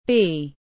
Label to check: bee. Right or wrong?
Right